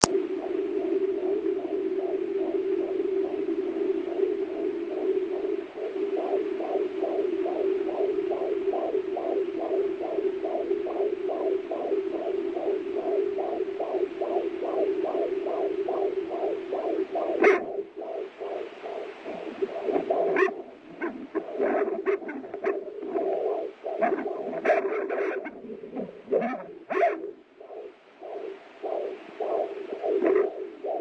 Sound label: Baby Moving Ends With Moms HR